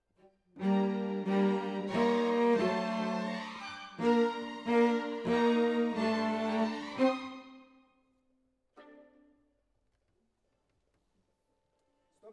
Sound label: Cello Solo